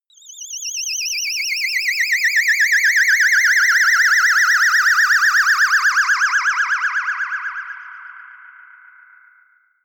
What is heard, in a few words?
ululation ufo